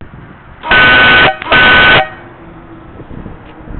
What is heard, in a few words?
airhorn